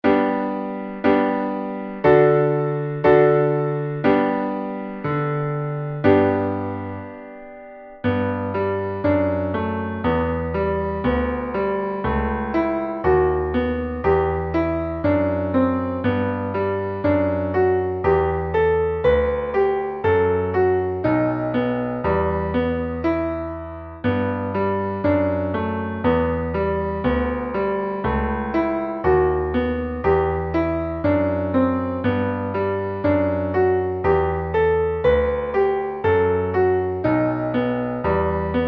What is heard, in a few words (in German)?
Bergauf, Klavier